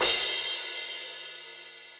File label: Crash 17 Direct Natural, Cymbal; Drums; Drum Hits; Standard Hits; Session Drums Studio